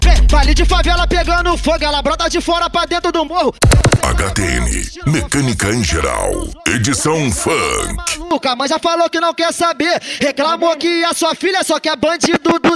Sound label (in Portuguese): mecanica em geral edicao funk